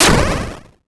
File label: shot